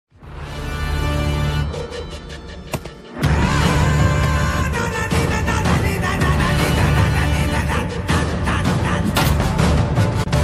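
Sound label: background music ringtone; ramarao on duty; ravi tej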